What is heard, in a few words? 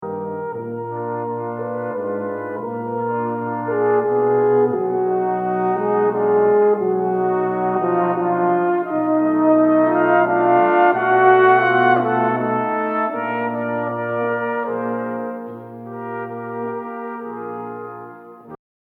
Waltz; Brahms